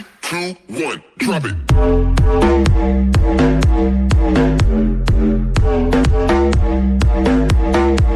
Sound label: drop bass